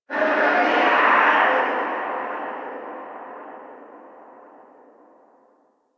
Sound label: Stadium Infested Far